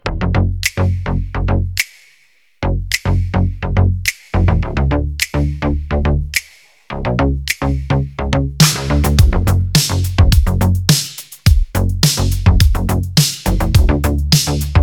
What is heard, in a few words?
synth